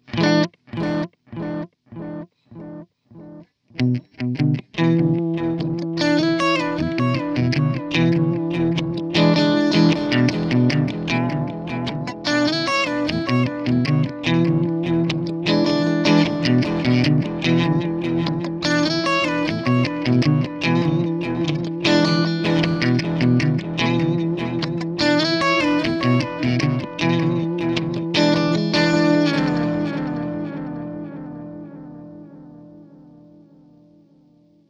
Silos Delay Tape alle Regler 12 Uhr; EQD

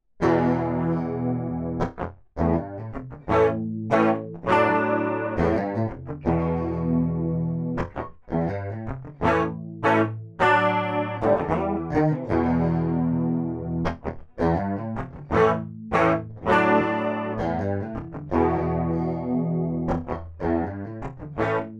DI Aufnahme Micropitch DI Signal

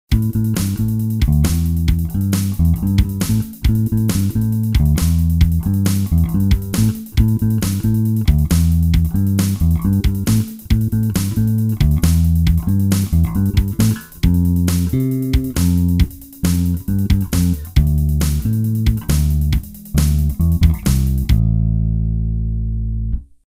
Harley Benton JB 62CC Beide Pickups

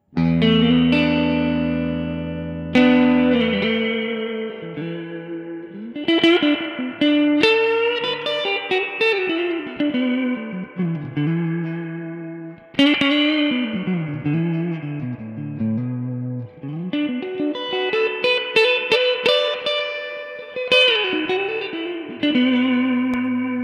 Crazy Tube Circuits White Whale Effektgeraet Rev Long Alle Regler 3 Uhr Trem Harmonic Soft

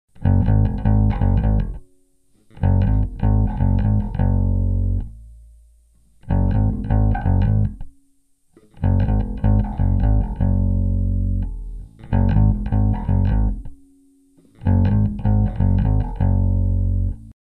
Harley Benton JB-62CC Beide Pickups mit Plektron

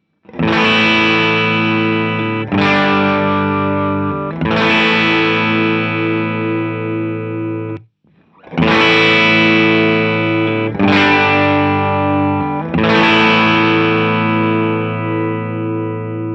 Teletronix LA-2A Overdrive mit LA-2A; UAFX

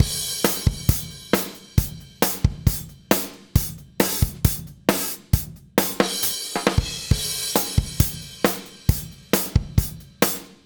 Kompressor Vergleich SSL Native Comp V6

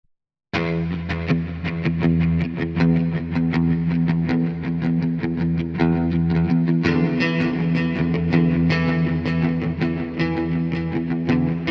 DI Aufnahme ohne EQ vor Plug in

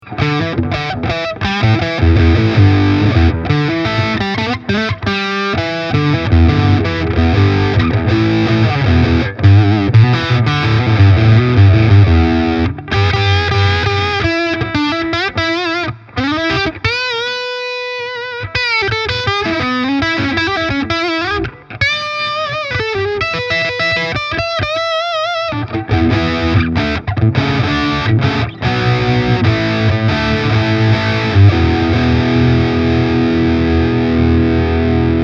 Harley Benton SC Custom III Active Steg verzerrt

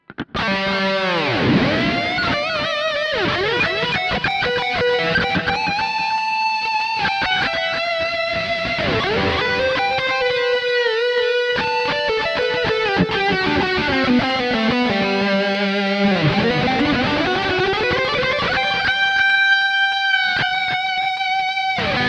EQD Silos Delay Digital Kontext Lead Boost Flanger

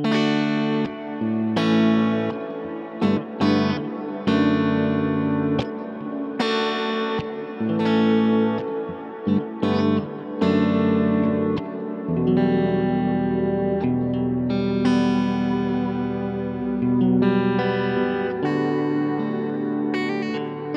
Ambient Haze Mittelstellung; Walrus Audio Fundamental Ambient

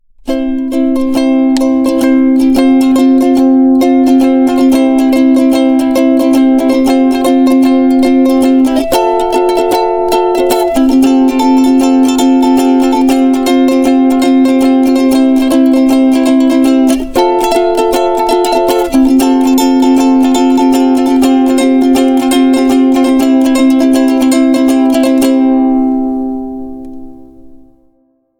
Begleitung

